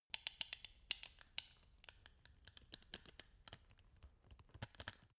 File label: scissors handle